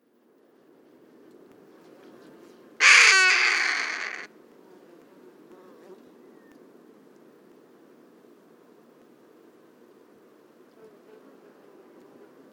Harriss Hawk

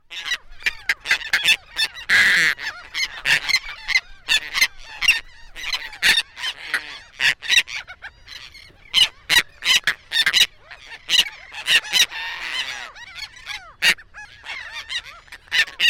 Royal Tern

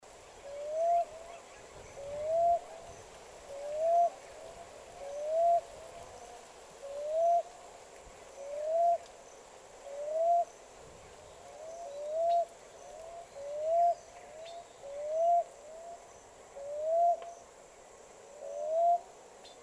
Common Ground Dove Columbina passerina griseola

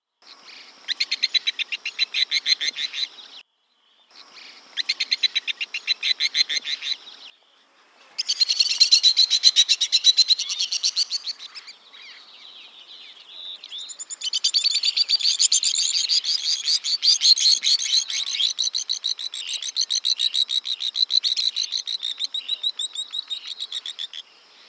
White throated Swift

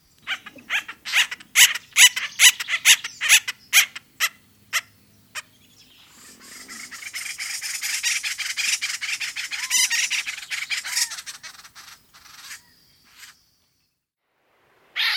Acorn Woodpecker

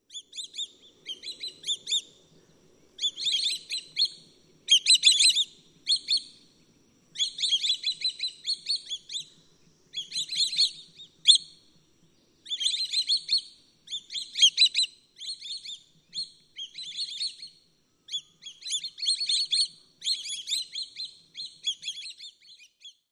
Swallow tailed Kite

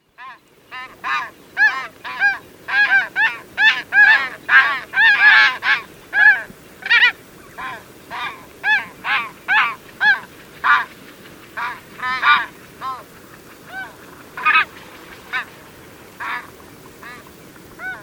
Emperor Goose

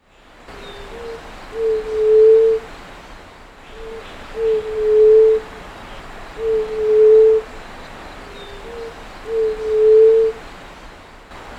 White tipped Dove

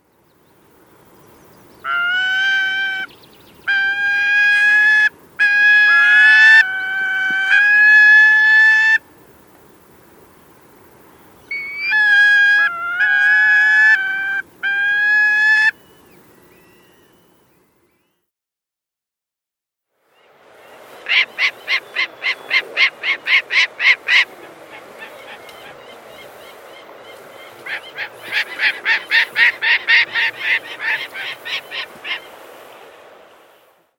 Gyrfalcon

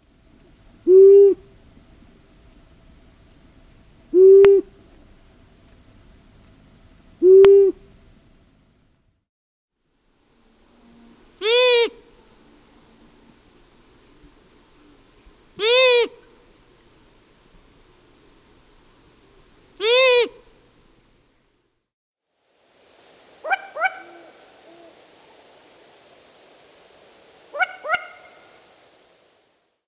Long eared Owl

